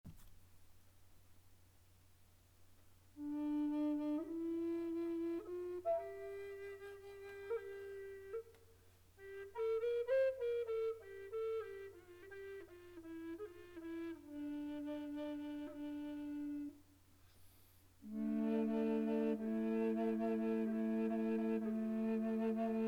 flute contre bass la re 440, flute amerindienne contrebasse

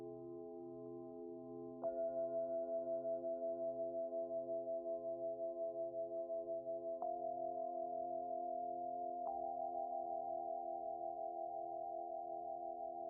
Voyage Sonore

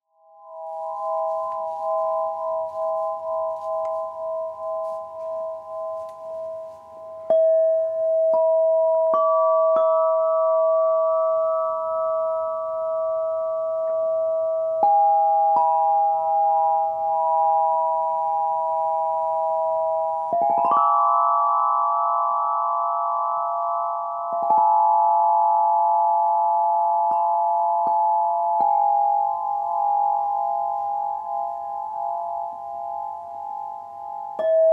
Mystic E, harpe de cristal mystic mi 8 tubes

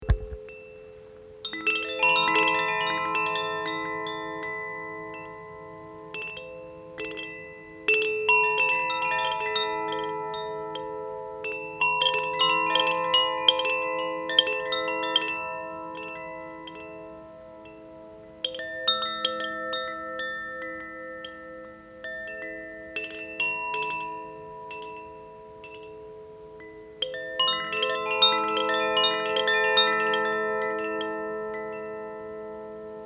Carillon Aria, carillon Koshi; Originaire de France, chaque carillon Koshi est fabriqué à la main et avec soin à partir de matériaux naturels; Om-Vibration

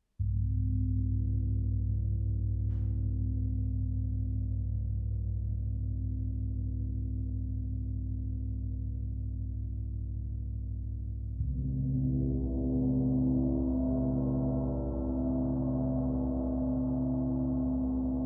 Gong Modele; gong modele 2

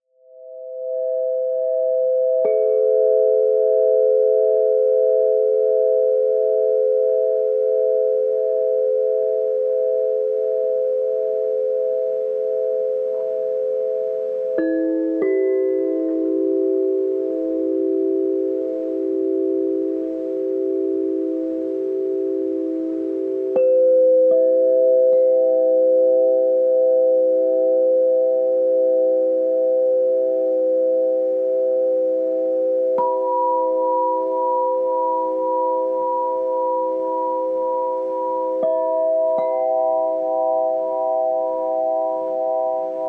Planetary C, harpe de cristal planetary do 16 tubes

